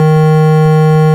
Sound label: ORG Clr D#3, Farfisa Clarinet; Keyboards of The 60's and 70's